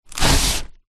cardboard box large slide; foley

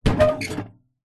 foley metal box large pick up handles

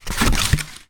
cardboard box lid spin on hard floor; foley; zapsplat

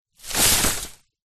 foley palm tree leaf land ground

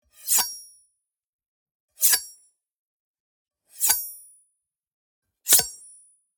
Gore Slice Slash Cut

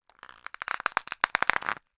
foley rubber thin stress bend

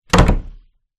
Door Slam

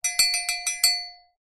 Cowbell

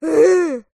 human child boy 8 english grunt

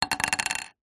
plastic object hollow small down on surface; foley

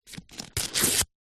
household plaster band aid in paper seal rip open

